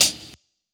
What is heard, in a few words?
Hi Hat